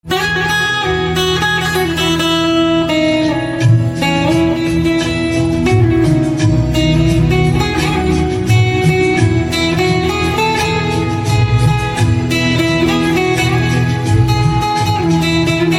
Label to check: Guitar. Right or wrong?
Right